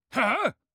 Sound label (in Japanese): 攻撃